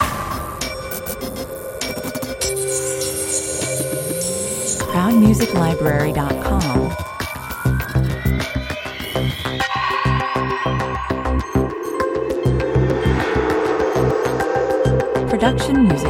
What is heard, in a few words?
technical dreams dangermix 100 bpm